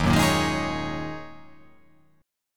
D sharp Minor 11th D sharp, chord

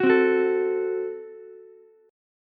F sharp Diminished F sharp, Ukulele; strummed; 11,12,14,12

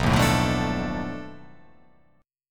B 7th Sharp 9th Flat 5th, down

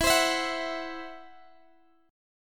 E Suspended 2nd Sharp 5th E, Guitar, Standard; strummed; x,x,14,17,17,14; JGuitar

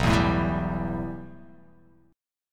B 7th Suspended 4th Sharp 5th

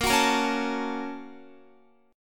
A sharp Minor Major 7th Sharp 5th, down, Guitar; A#mM7#5 strummed

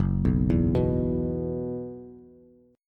F sharp 7th Suspended 4th, down, Bass; F#7sus4 arpeggiated

